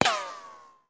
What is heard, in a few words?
bullet ricochet